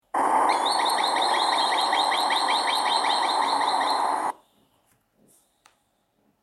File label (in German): waldwasserlaeufer; Waldwasserläufer; Vögel